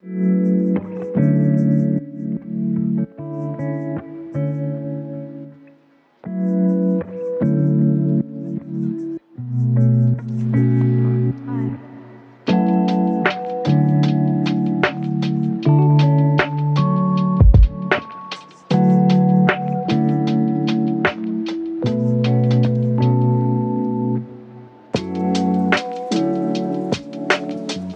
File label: lofi hip hop